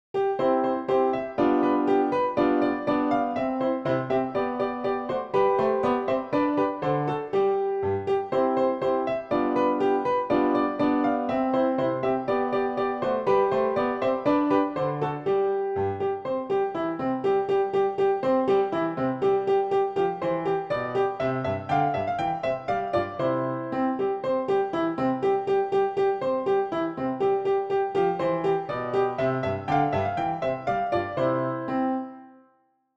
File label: Dance PianoTeq Bechstein; Dittersdorf 12 English Dances